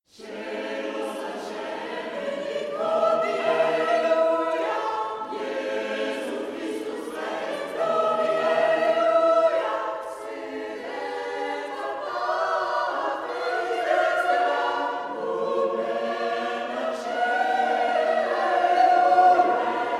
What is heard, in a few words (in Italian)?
Piena Voce; Coelos Ascendit Hodie; Alauda